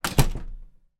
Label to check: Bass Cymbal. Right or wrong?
Wrong